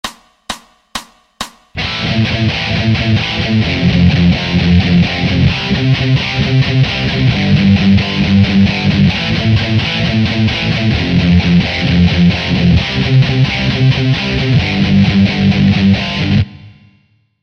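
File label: Rhythm Guitar Power Chords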